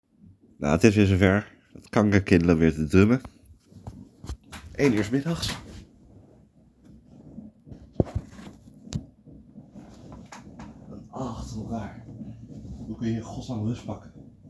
Drummen

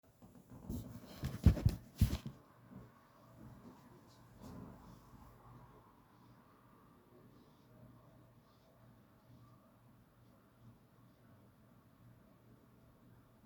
Hond raam open

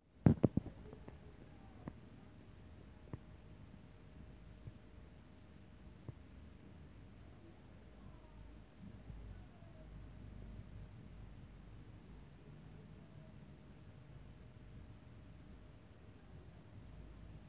joelen en schreeuwen

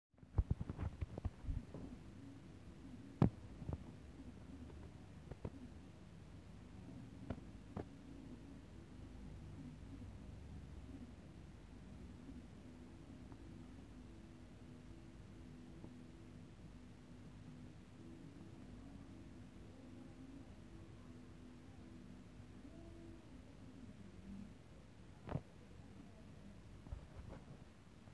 muziek

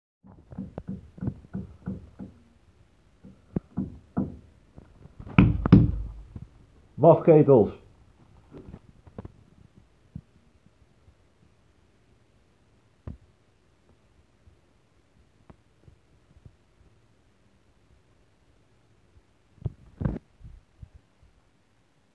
timmeren in de avond

